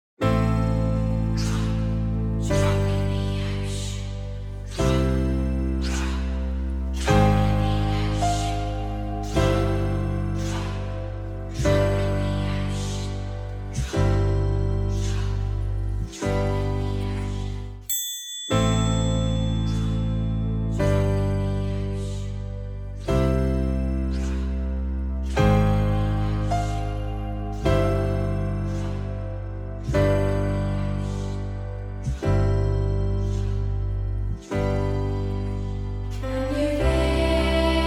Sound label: echo track slower version